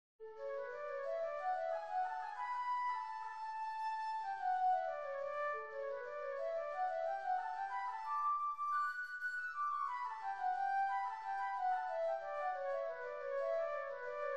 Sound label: Flute Excerpt